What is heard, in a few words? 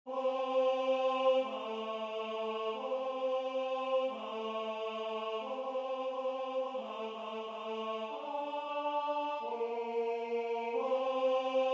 Tenor